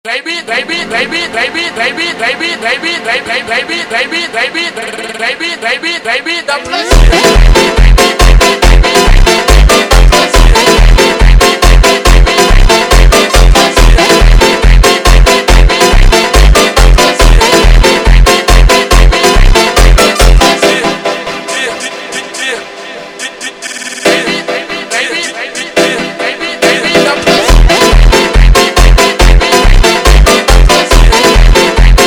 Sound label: phonk music